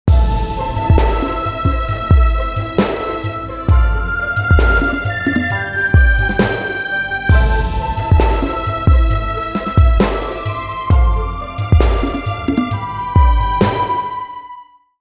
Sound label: Reflective